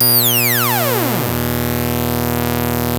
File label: ARP 2600 B2, synthesizer; Hollywood Edge - Giorgio Moroder Rare Synthesizer Collection